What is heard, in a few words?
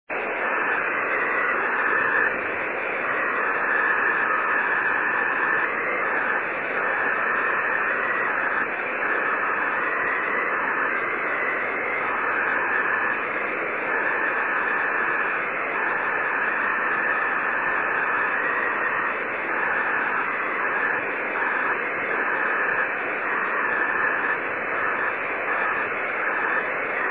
5G jt65c; WSJT JT65C; 横浜市; 茅ヶ崎市; ANT:45cm offset dish; Rain scatter; 雨、気温9℃ 湿度80%